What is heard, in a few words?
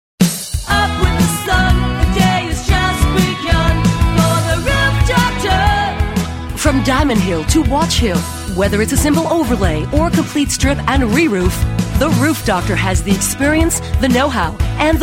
Singing jingles